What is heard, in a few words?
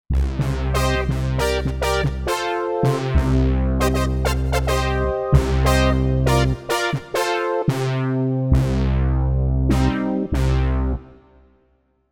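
Brass Obersync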